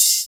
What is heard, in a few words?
HI-FREQ HH; Spectrasonic Distorted Reality 2; LO-TEK 2